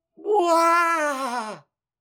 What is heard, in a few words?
Efforts, People Sounds; Foley Library; Judge John Hodgman